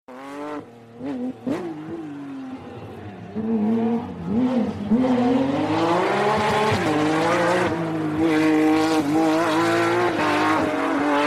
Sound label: classic car passing